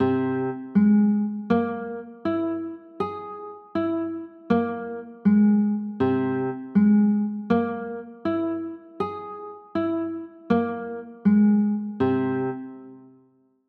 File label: c sharp 6 9, chords